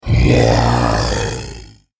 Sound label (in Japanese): クリーチャーボイス